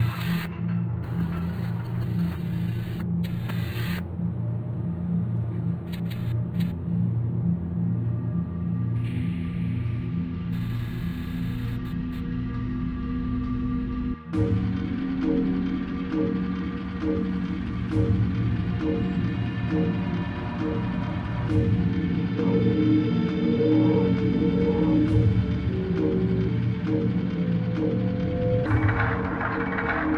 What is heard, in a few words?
Soft Snarl; bastard bonds